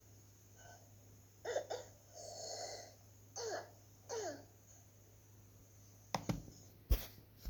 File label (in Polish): Szczekajacy kaszel